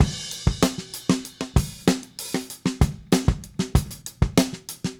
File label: Drums Crash, 70s Analog Funk; Sonic Foundry (Sony Creative Software); What it Is!